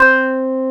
Korg CX3, C 1; Back In Time Records; Korg Universe VOL-1

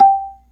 B3, Drawbars

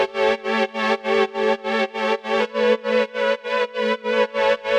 Pad 100 A, sidechained

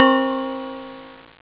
metal bells; twintris; ST-XX Amiga Soundtracker Sample Packs

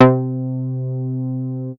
MOOG BASS C4; Best Service Dream Experience

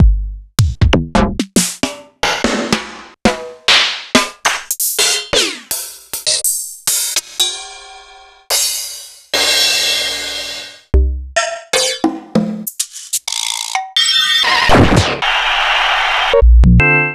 Kit; proteusvx

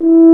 F HORN E3, French Horn

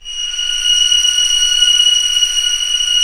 STR C6 PP; Sound On Sound; Peter Siedleczek's Orchestra

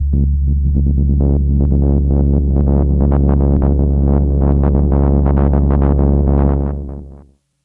Oberheim Pulse, Synths; Sonic Foundry (Sony Creative Software); Electronic Point Blank

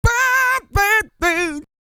SING, Vocal Planet R&B & Dance; ILIO

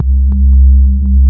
FILTER RINGS, TECHNO; Pro-Rec - Synthsonic; Z1 TECHNO

